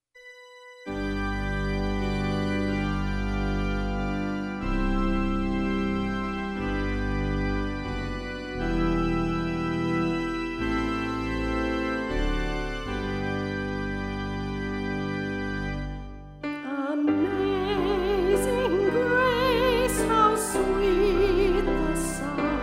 karaoke mix; Amazing Grace

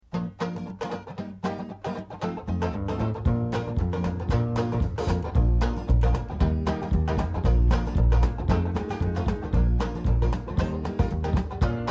Band&Vocal Track

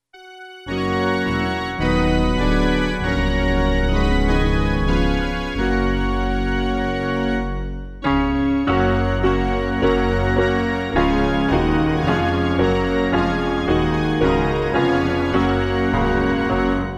instrumental reference mix; All Hail The Power Of Jesus Name

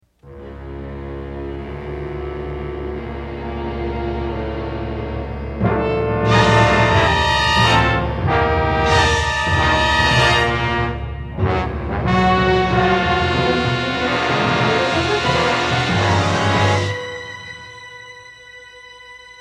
Crucifix Effects, Stereo Band Track

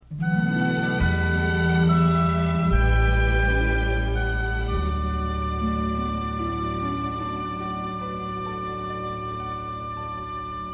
Prayer Response - Band Track

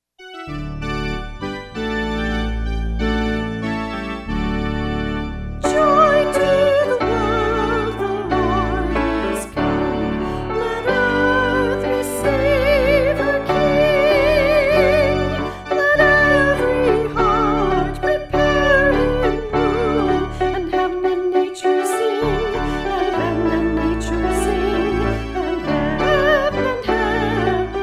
Pipe Organ rough mix; Joy To The World

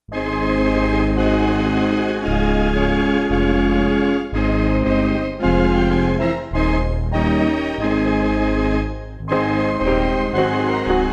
instrumental reference mix; Leaning On The Everlasting Arms

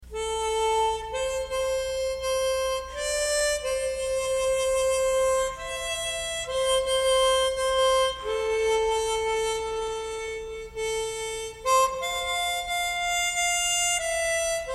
Harmonica Cue, Band Track

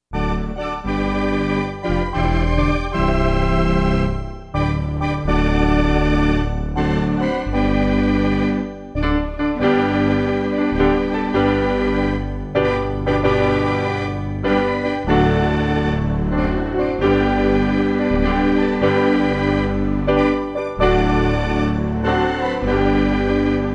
instrumental reference mix; Jesus Saves

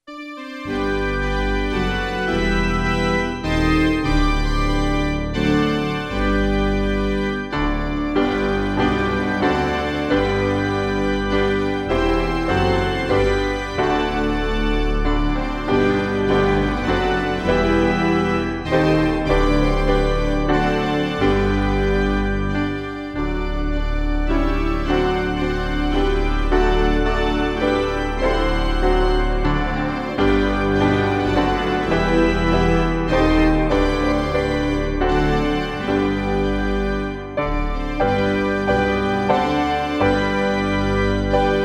instrumental reference mix; O Worship The King

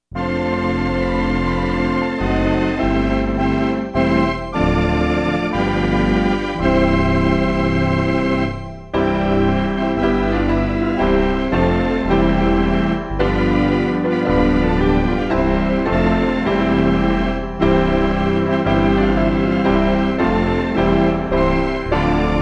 instrumental reference mix; At Calvary

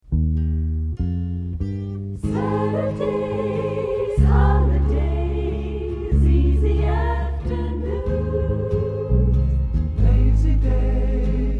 Stereo Band&Vocal Mix

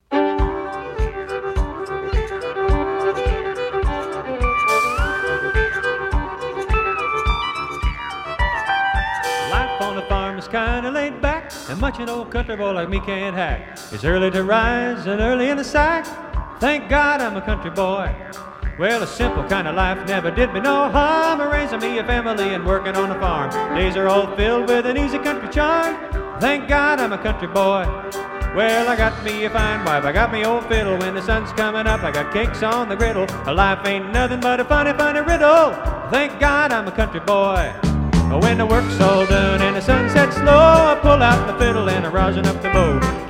Stereo Band & Vocal Mix

